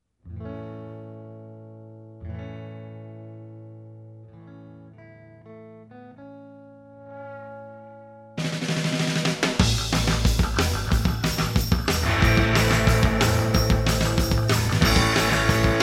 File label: Rock Jingle Bells